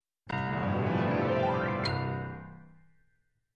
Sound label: Very fast Piano Scale Up